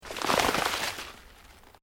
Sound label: Underbrush Dead Move, Rustling